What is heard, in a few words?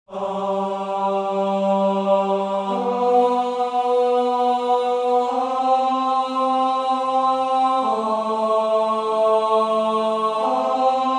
Tenor